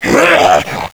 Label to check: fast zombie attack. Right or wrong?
Right